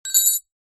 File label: industrial wrench bicycle set down concrete ground